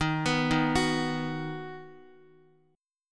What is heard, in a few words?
D sharp Minor Sharp 5th D sharp down Guitar; D#m#5 arpeggiated